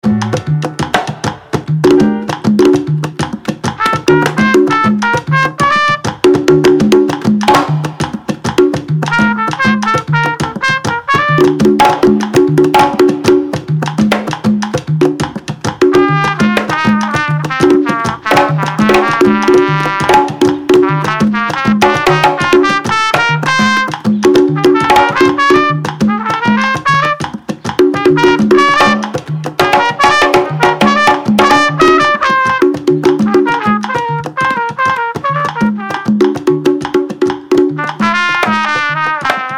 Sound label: Rumba; Trompa Rota